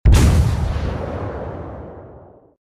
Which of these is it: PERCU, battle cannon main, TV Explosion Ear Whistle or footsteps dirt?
battle cannon main